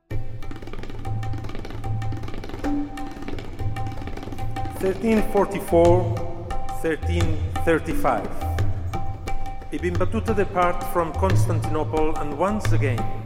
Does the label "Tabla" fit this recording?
Yes